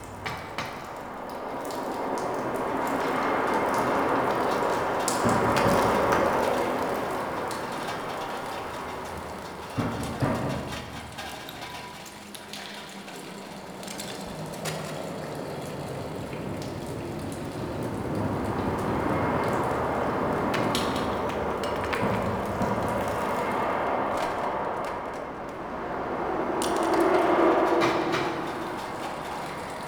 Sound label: Winter Exterior Tunnel Bed Loop, Ambience; Warframe; Nineteen Ninety Nine